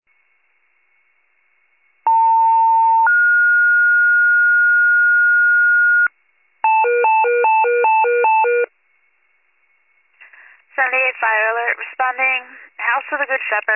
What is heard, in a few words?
fire, pager alert